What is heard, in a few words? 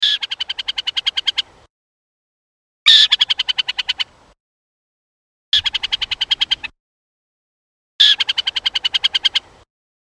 burrowing owl alarm